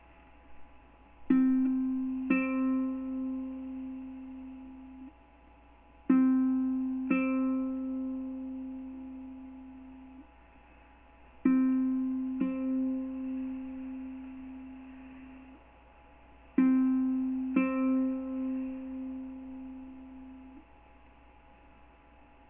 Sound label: ukulele DO